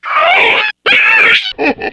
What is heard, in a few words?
pain, zombie poison; Half Life 2